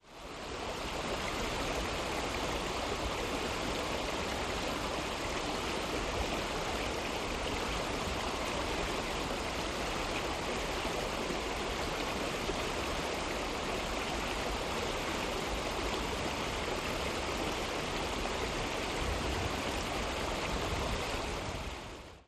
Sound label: Water: Natural, Medium Sized River Rushing With Some Close-up Definition. Stereo; rivers